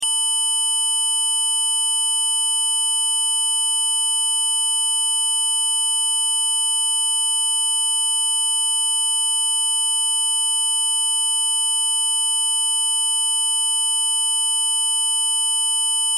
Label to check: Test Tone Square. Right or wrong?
Right